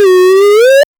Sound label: cute bullet shhot sound